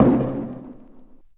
Sub37 Knock Wide Vinyl Synths C3; vinyl perc

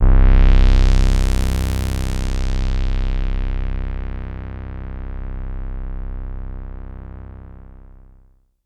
SYNTH Slow Attack; Cheesewizz

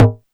Perc; EFM

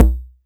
BD

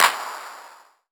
CLAP Dead Meat

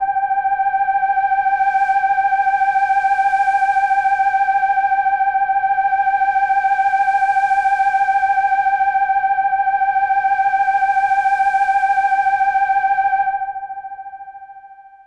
White Siren R G4; pad; Kawaii

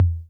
Tom Low C 808 Tape, Clean

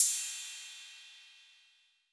Cymbal 808 Decay, Clean Digital

